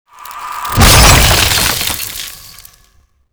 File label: magic ice blast